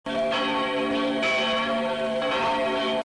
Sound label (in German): jacobiglocken; jacobikirche